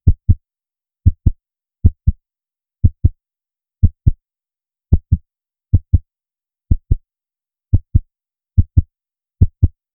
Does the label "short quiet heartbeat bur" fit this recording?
Yes